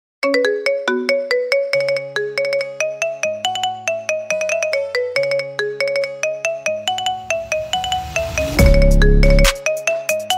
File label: Marimba Remix